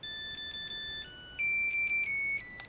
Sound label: nokia 3210 matilda ringtone